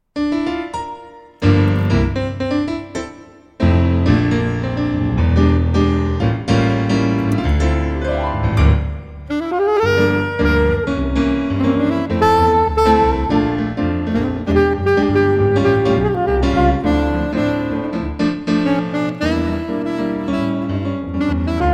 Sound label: sax